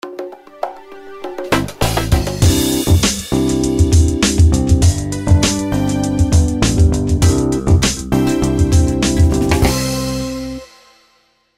station break

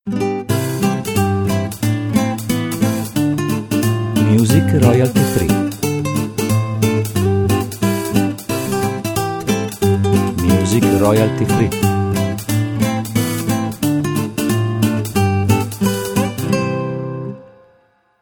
Childrens

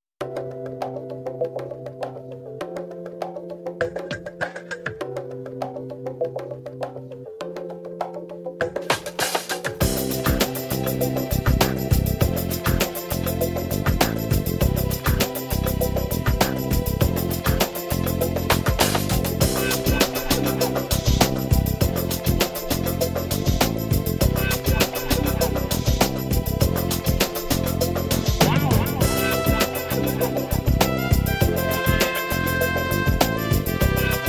Lounge

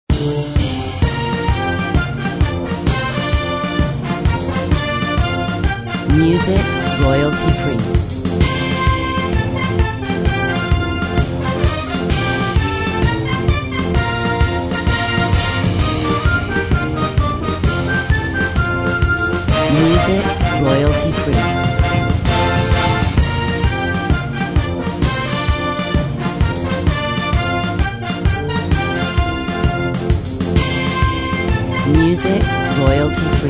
Childrens March

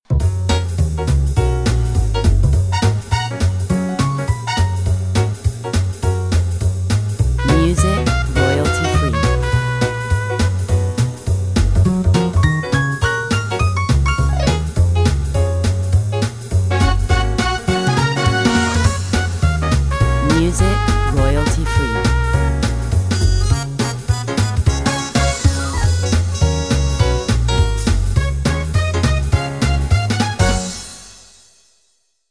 Jazz and swing

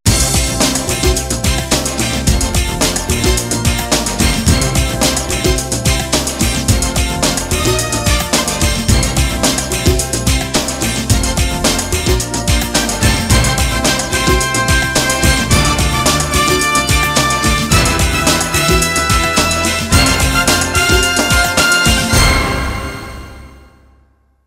Upbeat pop

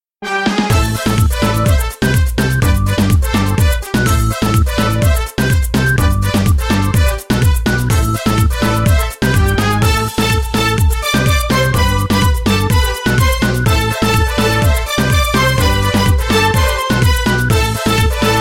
reggaeton

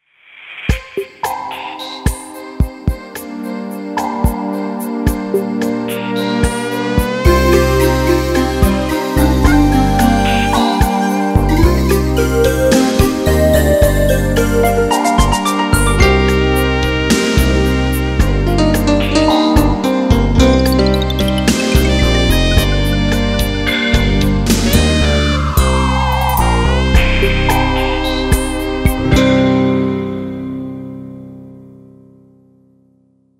soft

